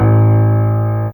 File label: Grand A2, Fairlight CMI IIe, Pianos